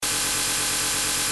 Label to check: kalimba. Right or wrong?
Wrong